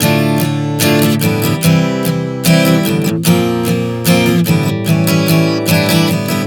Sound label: Progression 074 Dm-C-Bb-A7, Acoustic Guitar, Chord Progressions 074 bpm; Sonic Foundry; Songwriter Acoustic Guitar Companion